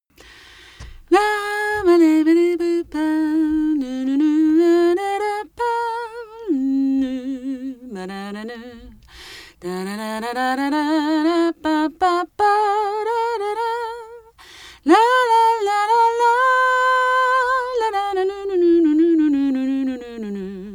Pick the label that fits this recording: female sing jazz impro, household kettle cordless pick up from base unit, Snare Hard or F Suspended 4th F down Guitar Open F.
female sing jazz impro